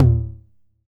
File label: V9 Tom H; DIRTY 9